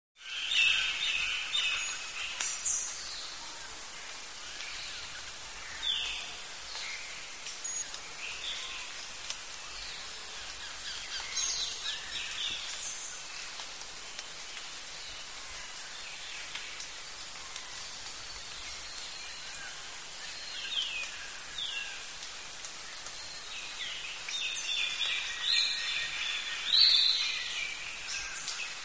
Jungle Rain Forest

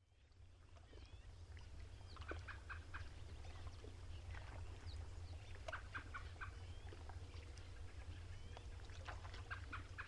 Light Birds Movement, lake ambience

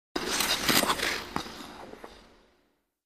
Ice Skating Heavy Sk

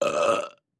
Med Belch; belching

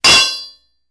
Metal Hits Metal Pipe

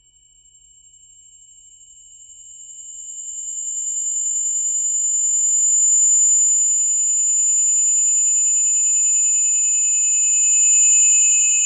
Sci Fi Old School Analog, ambience

